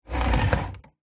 household chair wooden old slide scrape wood floor recorded under seat